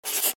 Key Tone; LG KH5800

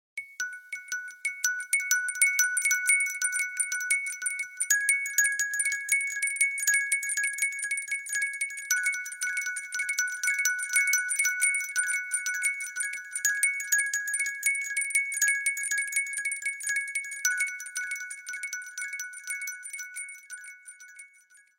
Spell; Nokia N86

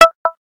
Letter, notifications

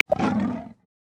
Snow Leopard; Xiaomi Redmi-8A

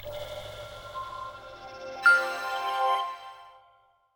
shutdown; Samsung GT-i5508

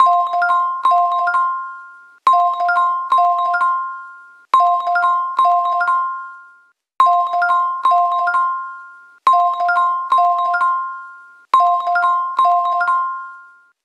ringtone ringing; The Light Phone II

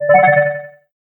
Cobalt, notifications; Pantech Vega Secret Note 2

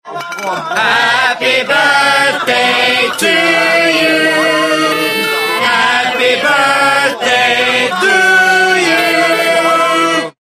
happy birthday to you; Arphiola ringtones; Greetings

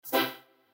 stop rec, camera; Sony Ericsson; Live with Walkman

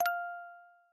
music box, notifications; OnePlus OxygenOS 13; dynamic notifications

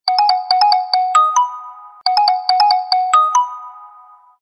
Short Tone

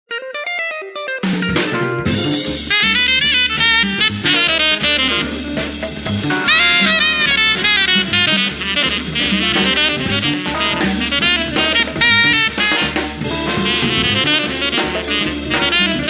Jazz Bar; Samsung GT-S8500 Wave

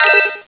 collect bonus; Bounce Touch

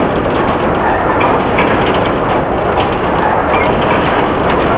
metal machine; Nokia; 5800 XpressMusic; Bounce Touch

